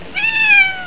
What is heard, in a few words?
cat small